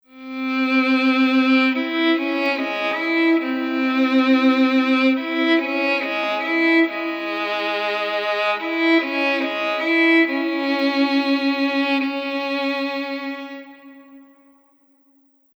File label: Violin